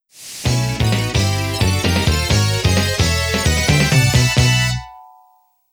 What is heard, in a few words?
Game Clear Jingle; tokimeki memorial 2